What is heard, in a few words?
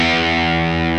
GUITAR, POWER GT